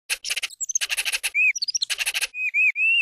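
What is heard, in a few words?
laughs; Star Wars Knights Of The Old Republic II; XBOX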